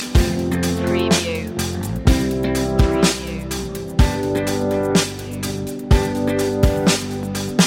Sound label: triumphant loop; self confident